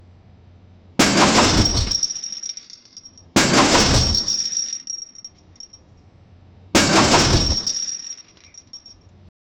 the heavy metallic clatte, ship

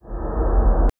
nature elemental roar air

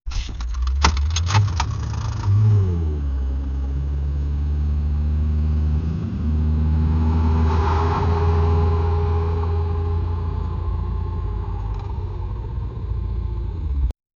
sound of a cartoon car

